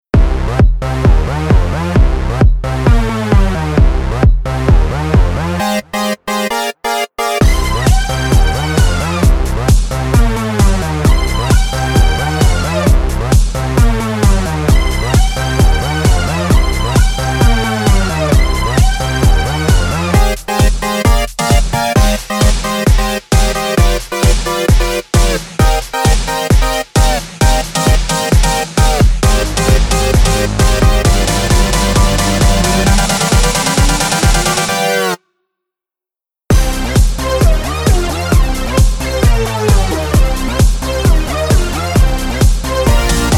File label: EDM; ABILITY